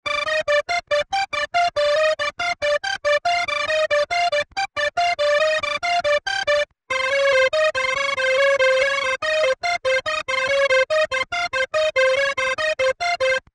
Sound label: Game Faster Walking Loop